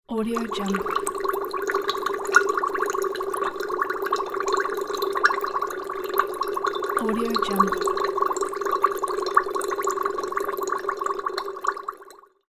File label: bubbling glass beaker